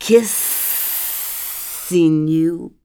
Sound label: Snake